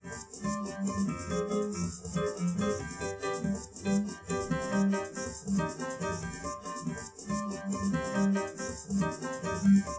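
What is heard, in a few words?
with drums; SiSEC10; underdetermined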